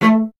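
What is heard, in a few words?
string cello A, Solo Instruments; Miroslav Vitous; Solo Instruments VOL-1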